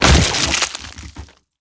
break bone

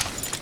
move effect

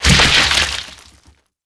break bone

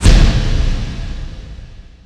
Health

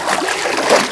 WR swim, swim

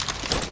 move effect

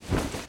crawl

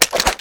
change weapon

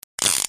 spanner use

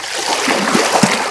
WR swim, swim

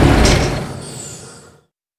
DPV End, Vehicles; WarRock

